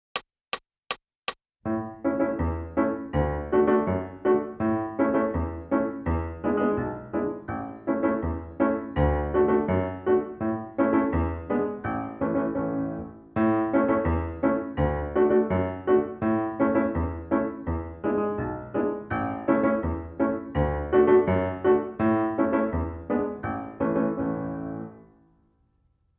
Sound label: Piano; Boil 'em Cabbage Down